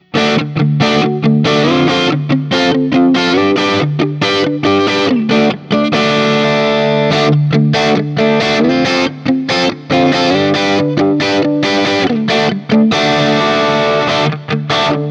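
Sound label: Guild Starfire I SC JCM800 SC D, guitar; Guild Newark St Starfire SC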